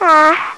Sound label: Baby Uhh